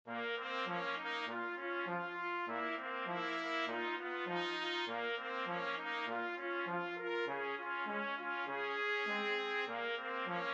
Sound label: 2 trumpets trombone